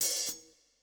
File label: Kit, breakcore Drum Hits